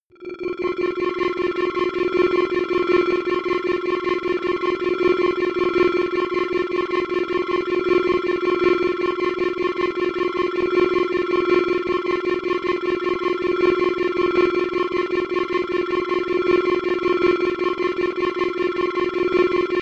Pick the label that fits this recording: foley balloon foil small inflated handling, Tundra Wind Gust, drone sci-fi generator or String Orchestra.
drone sci-fi generator